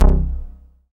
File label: K-2000, Synth Bass